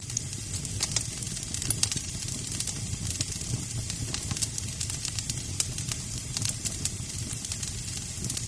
Fireplace Crackle

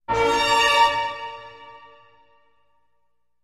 Music Classical Str, orchestral

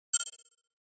HF Elect Bouncing

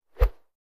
Golf Club Swing Miss

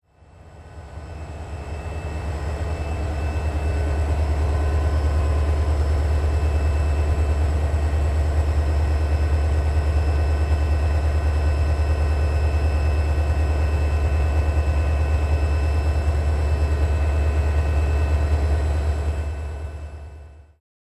Train Station Amb

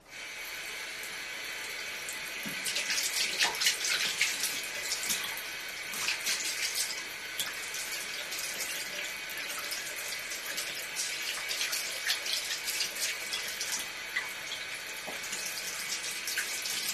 Sink Faucet, W Squeaky Water On Off.

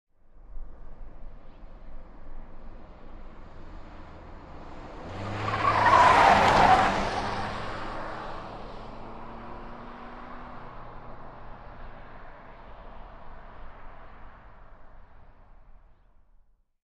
Nissan Sentra Hard, compact

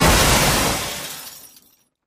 Car Crash

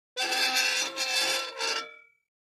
Metal Scrape On Metal

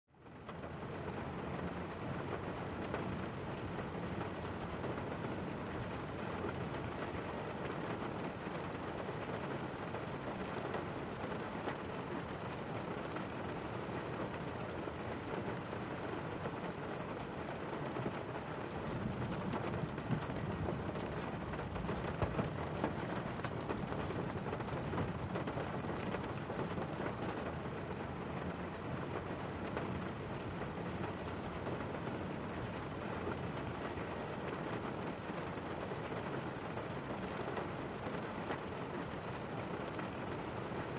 Rain Heavy Steady

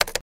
Button Push Click

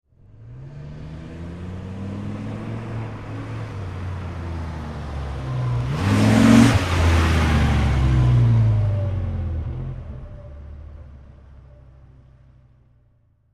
Dodge Ram Medium Acce

